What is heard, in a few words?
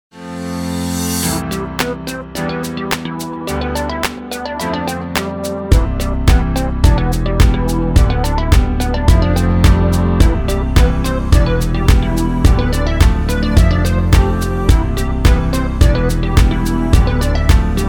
Upbeat Corporate